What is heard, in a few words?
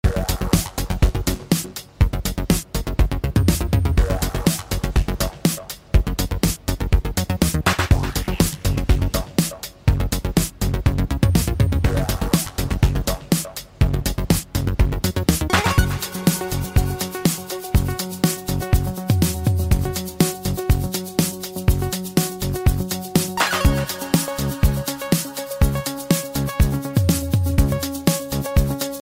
english ringtone; english ringtones